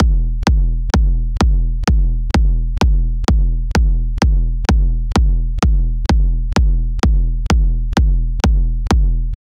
generate a hard hitting, edm

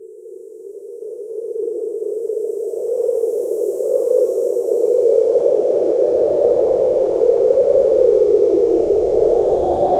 alien parasite airship

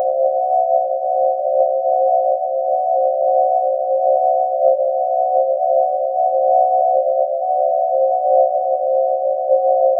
warm smooth, meditative

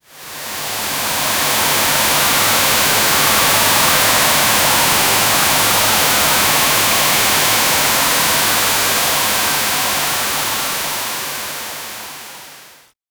heavy rain in a future

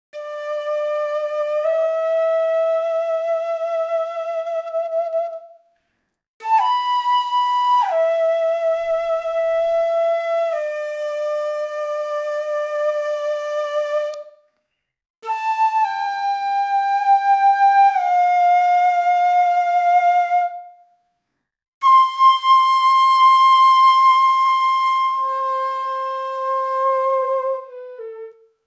Japanese koto with bamboo; asian

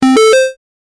an error sound effect for; fps

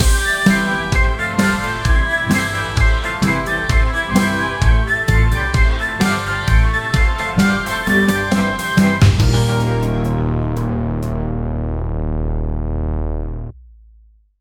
result page stylish music, detective

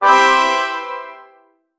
small satisfying sound; small satisfying sound wh; achievement

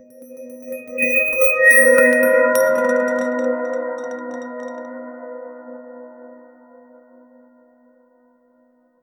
mysterious chime slow

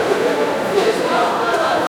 historical

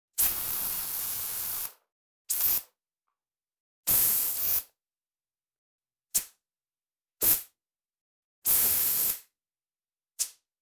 realistic aerosol air fre, bathroom

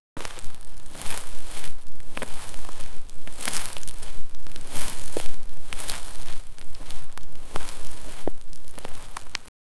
realistic footsteps on dr, sand

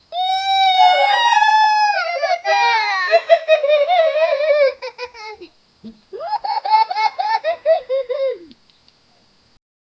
three young boys laughing; children

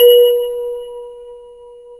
health pickup realistic

